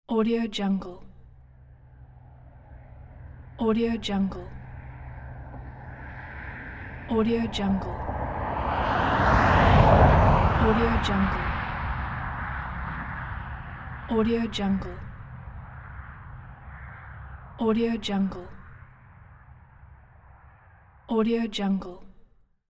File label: highway drive by